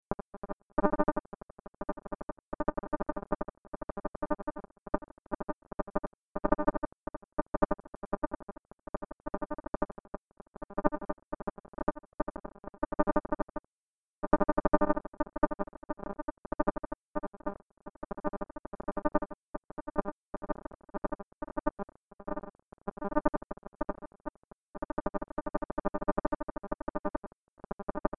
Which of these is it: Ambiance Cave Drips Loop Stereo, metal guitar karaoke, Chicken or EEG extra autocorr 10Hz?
EEG extra autocorr 10Hz